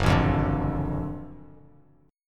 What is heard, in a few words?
F sharp Minor Major 7th Sharp 5th F sharp down Bass Standard; strummed